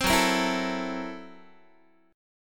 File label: Gb 7th Sharp 9th Flat 5th Gb Guitar; strummed; Gb7#9b5; 15,14,13,14,13,x; JGuitar